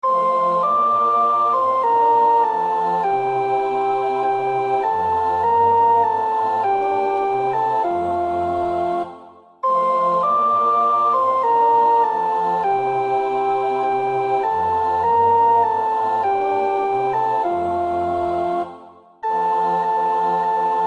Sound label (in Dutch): sopraan; Nieuw Liedboek; NLB.438 God lof! Nu is gekomen